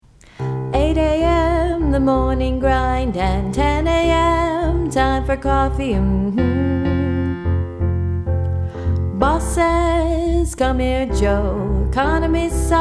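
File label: female vocals rough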